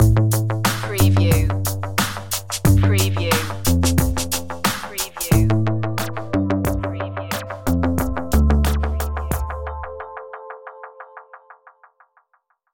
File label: hip hop stinger; urban drama